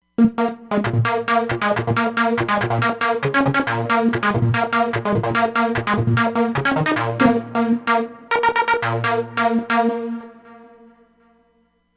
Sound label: Short Detune